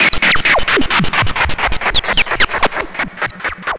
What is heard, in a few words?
AUTOMATE, MACHINES; USB Soundscan vol.33; AKAI; Disjointed Textures